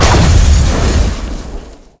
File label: Enemies Exploding; GemBattle